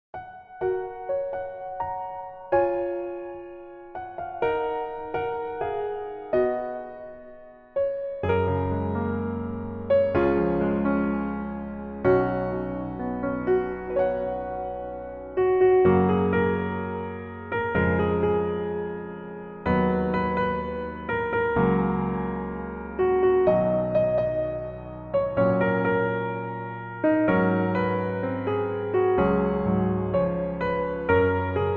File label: Piano